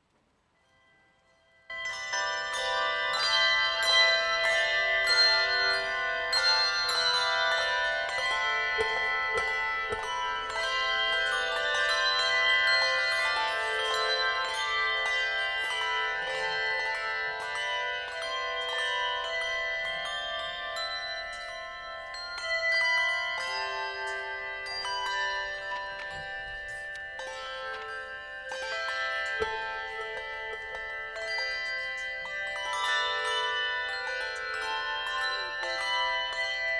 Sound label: Anthem, Carillons